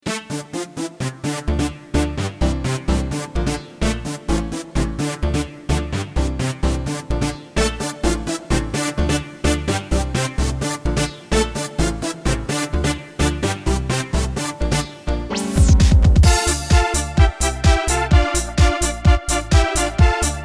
Minimal Techno; AjamSonic

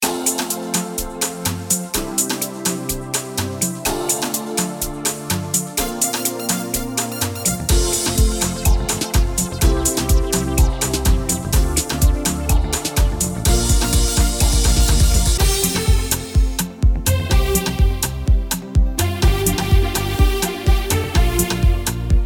Afro Pop; AjamSonic

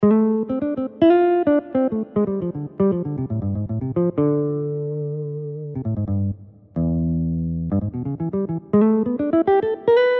jazz guitar

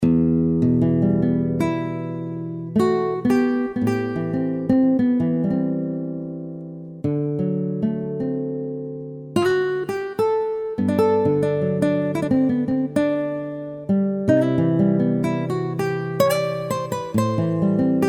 sweet nylon

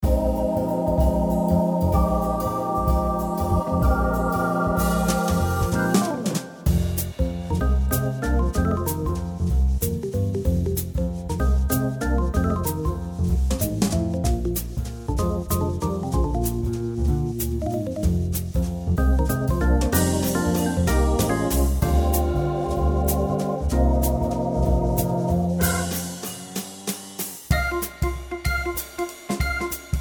jazz waltz

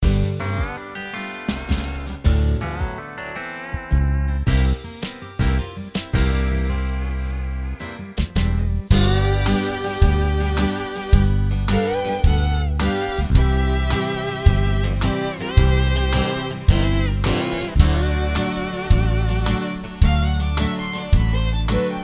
slow country; AjamSonic

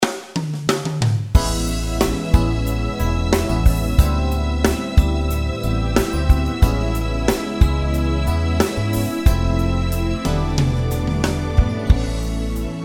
pop saxophone

